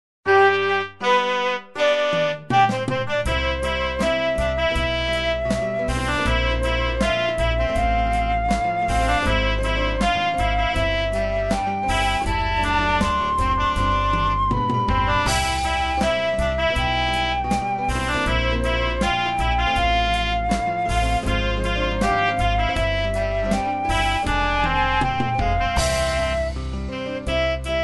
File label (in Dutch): Finale instrumentaal